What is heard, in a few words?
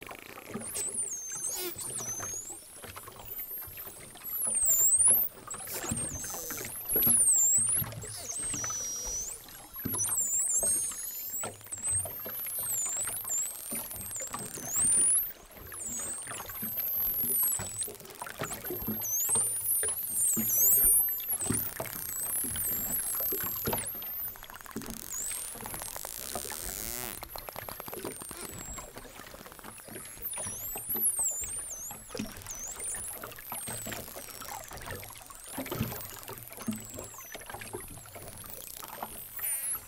bottlenose dolphins hydrophone; pulaboat